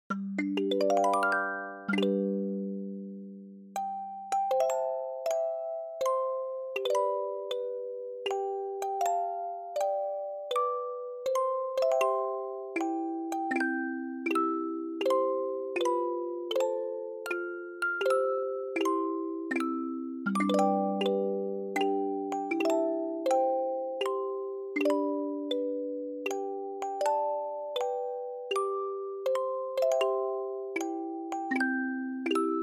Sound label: Happy Birthday, music box